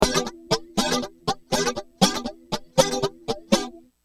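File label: rhythm guitar, MXR PSD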